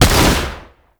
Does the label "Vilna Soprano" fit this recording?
No